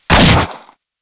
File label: Fire Shot 2D, Weapons; Warframe; Bronco